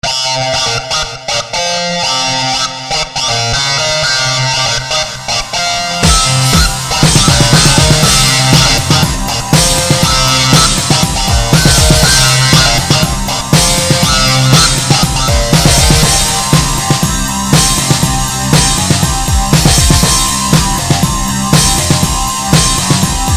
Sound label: turk Pop